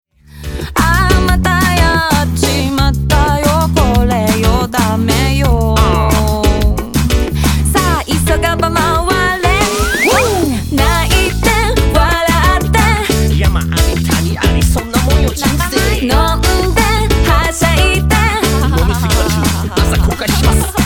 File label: CLAP